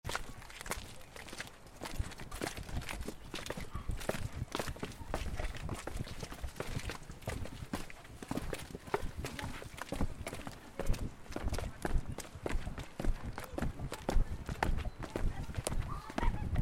ambiance marche sentier rapide; college vallee du thore

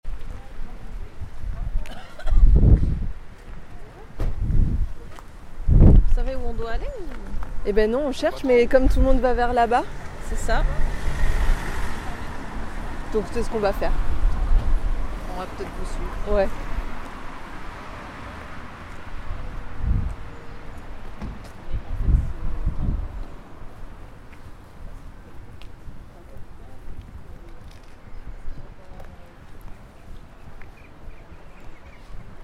AMB RUE ET OISEAUX CLAIRE; college renee taillefer; ambiances diverses

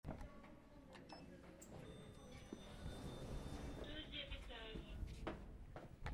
BRUIT ASCENSEUR 2EME ETAGE

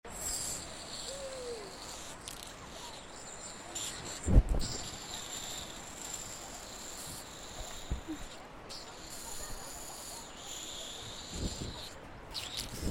AMBIANCE PASSERELLE PEUT ETRE ACIER; college vallee du thore

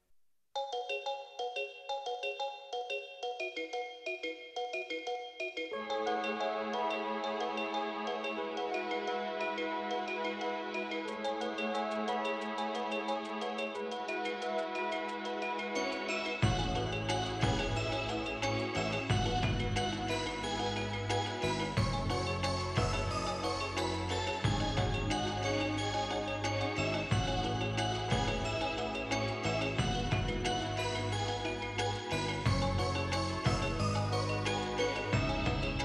Musique generique de fin; Mon voisin Totoro

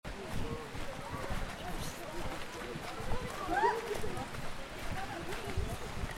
AMBIANCE MARCHE SENTIER EN GROUPE; college vallee du thore

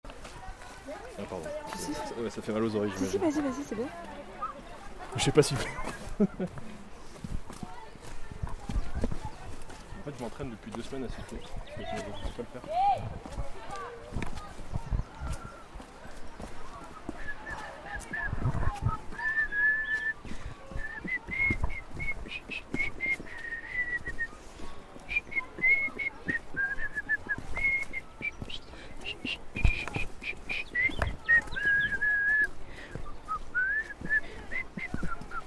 ambiance sifflements sur sentier; college vallee du thore

